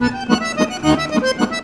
ACC LINE, MELODIC; Best Service; World Colours

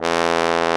BRS TROMBO, Trombone; Roland L-CDX-03 Disk 2

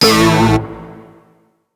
Sting, Keyboards

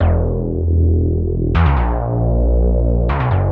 Bass 136-E, horrorscape samples 136bpm

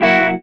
guitar stab

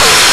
SUPER SCRAB, Techno Trance, Perc

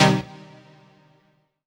HOUSE, House Dance; 90 s Sample CDs; Best Service Dance Mega

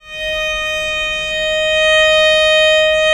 VL D#4, VIOLE, Historical Instruments; USB Soundscan vol.23

